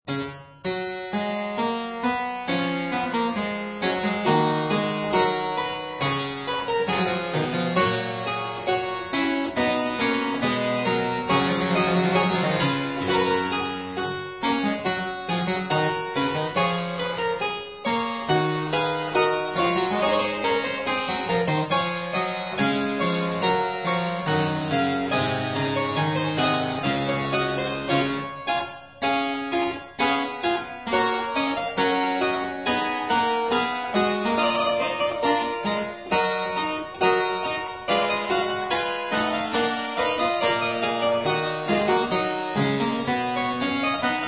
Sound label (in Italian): a 1 ovvero 2 Clav.; Variatio 29